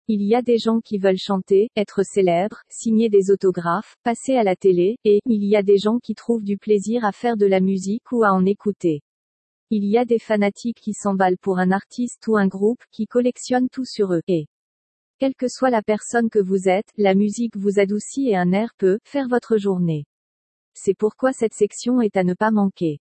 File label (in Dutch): Muziek